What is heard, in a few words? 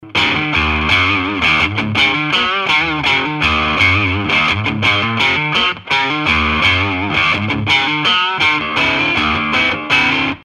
Neck + bridge drive, electric guitar; Fender Japan TL 43